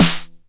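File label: 909 SD Tape, snare drum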